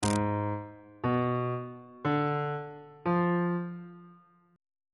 g sharp diminished 7th chord intervals on bass clef